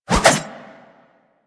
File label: Pole Arm Spin Whoosh; Warframe